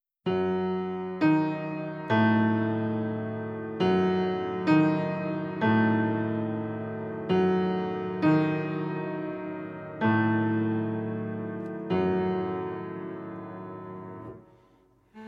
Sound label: Cello and Piano; La Lugubre Gondola; Trio Dmitrij